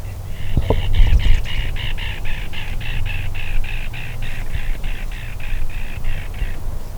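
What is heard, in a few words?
Black rail grr; National Marsh Bird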